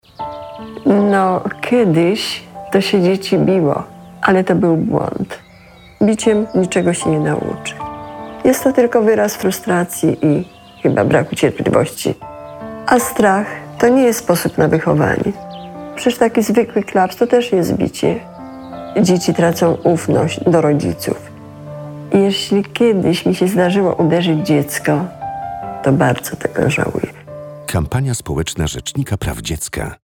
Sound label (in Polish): bicie